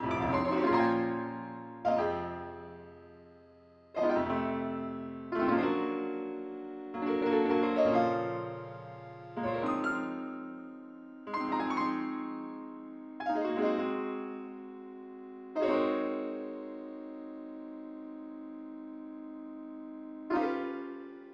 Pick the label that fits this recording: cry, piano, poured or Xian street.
piano